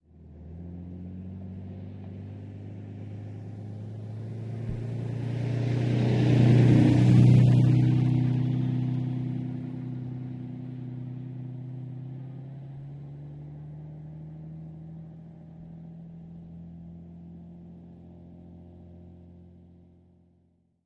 Car Sports Car Medium

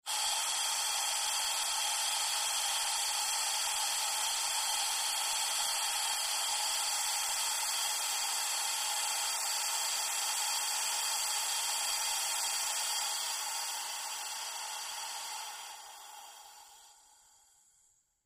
Long Air Release From

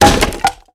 Axe Chops Wood Single

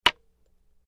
Wood Staff Hits Light, spears & staffs

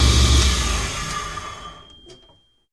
Table Saw Shut Off

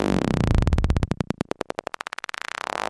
DISRUPTER; disrupters

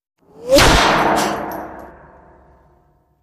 Bullet Impacts Sheet

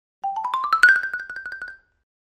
Xylophone Accent Shr

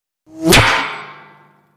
Bullet with Whir Impa, metal

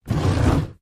Slide On Floor, trunks

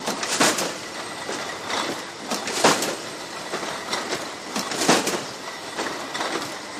Bottling Plant Loop, factory

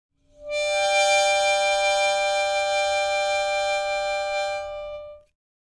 Mouth Organ Soft Fina, harmonica; woodwinds

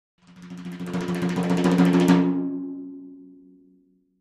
Timpani Hands Speedy; mallets timpani

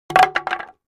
2x4 Plank Dropped On

